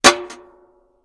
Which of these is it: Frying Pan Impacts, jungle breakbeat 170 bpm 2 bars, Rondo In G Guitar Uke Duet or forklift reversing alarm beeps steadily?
Frying Pan Impacts